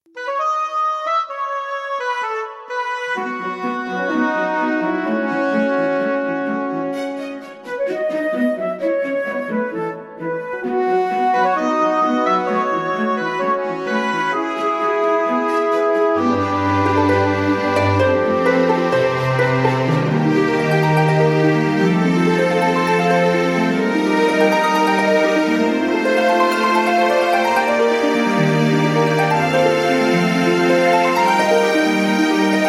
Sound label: a good life oboes